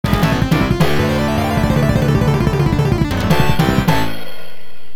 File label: arcade game over; captain commando